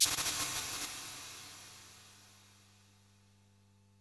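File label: percussion, 140 BPM, drum & bass; Ueberschall; Drum & Bass Resonance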